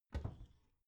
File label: bathroom cabinet door creak open regular